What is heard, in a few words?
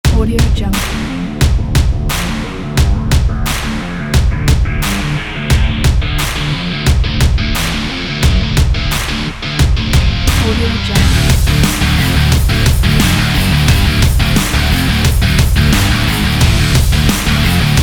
hard rock